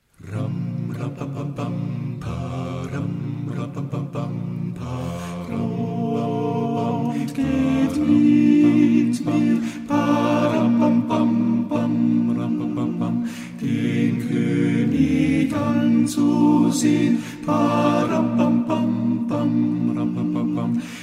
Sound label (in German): Trommellied